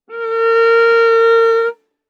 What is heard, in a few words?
Viola arco ff sul C Bb4 stereo; MIS Pitches - 2014; Strings